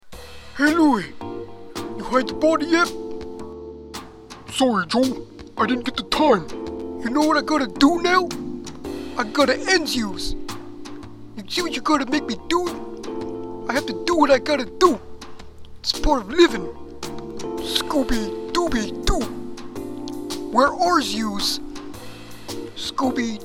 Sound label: 60s Gangster Attempt